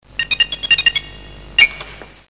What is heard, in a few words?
cheap dirty piano; KEYS ORGAN PIANO